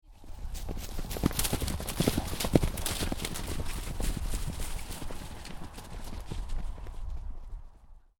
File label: Horses Run Past Trampling And Leaves Rustling; 3-4 horses trotting, hooves moving in gloppy, sloppy mud; Several Horses Trotting; Horse Sound Effects